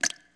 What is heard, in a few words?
drip; school; Tony Hawk's Project 8; XBOX; inschool